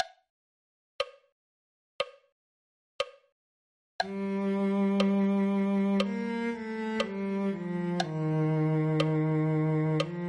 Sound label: cello